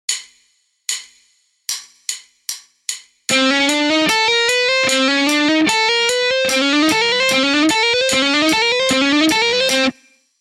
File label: string skip legato